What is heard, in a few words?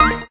lucky Reel Two; jewel fruits